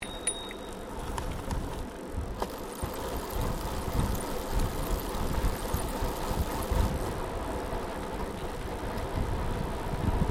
Bicycle Riding Smooth Concrete Pedalling Downhill Bell Ring Stereo, 21 speed road bicycle; Giant Crx3